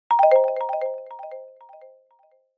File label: multimedia alert system